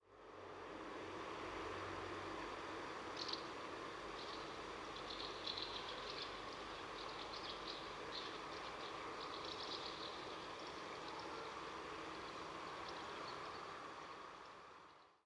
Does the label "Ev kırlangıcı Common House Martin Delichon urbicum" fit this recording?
Yes